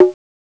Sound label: CONGA; CMI